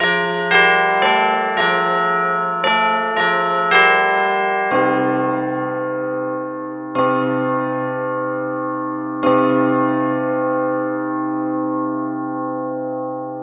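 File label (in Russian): звон колокольни с реверберацией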